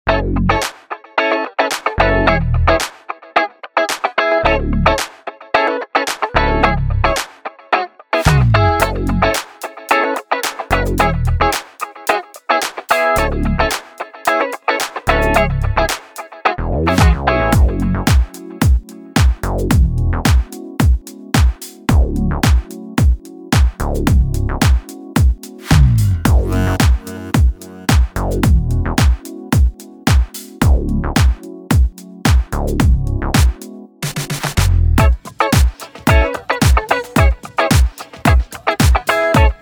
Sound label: Mind the Funk, Dance